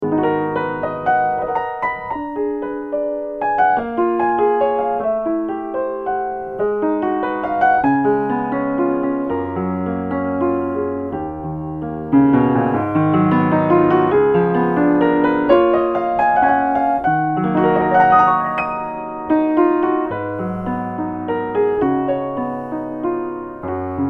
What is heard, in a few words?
Yamaha U3 S, piano